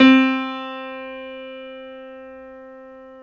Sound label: PNO, Grand Pno, Roland